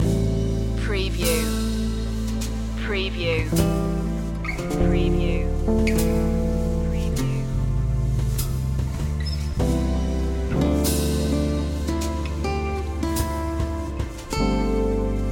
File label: a simple love song loop